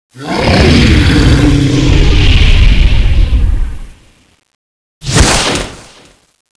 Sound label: attack hole; oberon